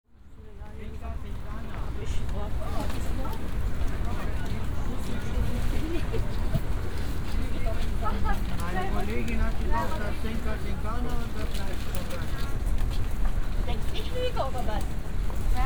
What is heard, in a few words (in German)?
Markplatz Obststand; soundmaps